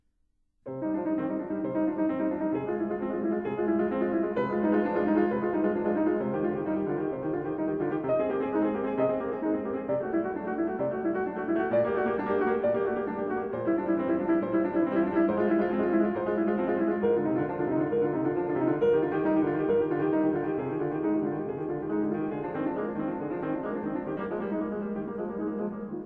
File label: Prelude; No. 2 in C minor BWV 847